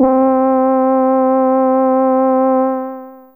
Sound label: Brass1 C3, Fairlight CMI IIe